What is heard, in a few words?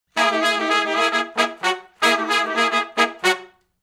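Funk Riff (F#) harmony, Horncraft for R&B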